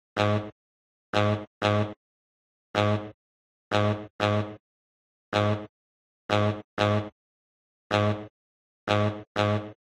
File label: sax